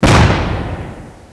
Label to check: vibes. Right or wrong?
Wrong